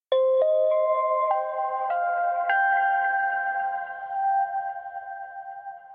Tension Fill

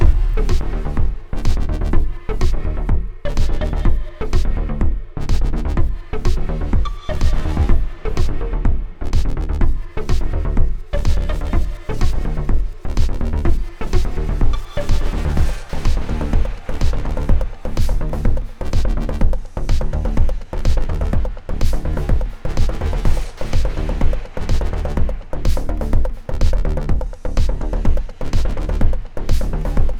Boss Battle Main Loop Layer - Stereo Out; Glitch's Trip; Layer 1

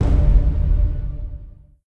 Music sting; music stem extracts

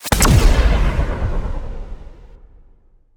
Implode

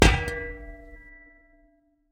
Hit Spade Brick

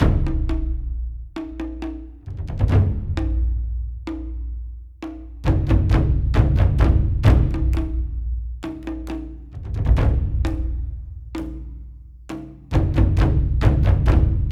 Drums; For Honor

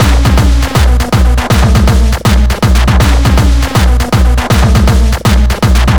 Beats Loop; Anger Foot

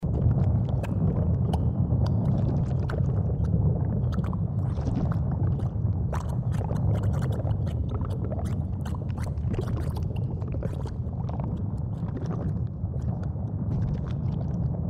Lava Environment; U.B. Funkeys

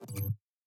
Ui Menu research row hover

